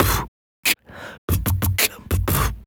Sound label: 90 RnB, Human Beat Box; Loopmasters